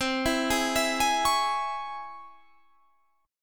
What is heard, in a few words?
Db Minor Major 7th C